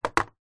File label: videogame controller xbox set down wood table